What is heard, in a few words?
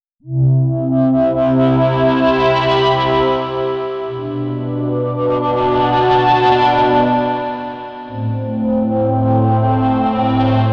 Bowed Guitar Pad